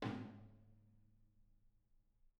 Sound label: R B Rack Tom A Room; Sonic Foundry (Sony Creative Software) - Drums from the Big Room The Mixes; R&B One Shots